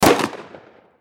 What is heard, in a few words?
mini14